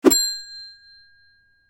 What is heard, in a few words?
Cheerful swipe transition; shorts sound effects